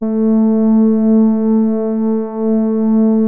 MOOG HARD A2, LEAD; Music Store - Claudius Diemeter Schwerlos

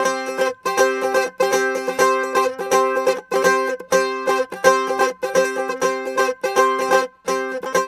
Mediteranean Traditions; USB Soundscan vol.53; AKAI

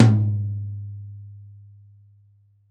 TOM, Drums Acoustic; USB Soundscan vol.10; AKAI; RAW KIT 7

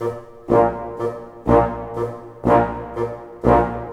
SNAR, Snare Army; East West - Scoring Tools

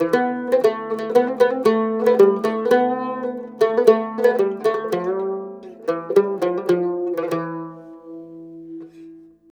CUMB, CUMBUS, Mediteranean Traditions

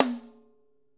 ROTOTOMS H 3; Sonic Reality

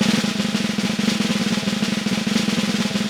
Snare Drum, 5X14

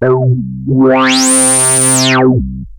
OSCAR C3, Rare Synthesizer; Hollywood Edge - Giorgio Moroder Rare Synthesizer Collection

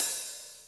HATS, Drum Kits

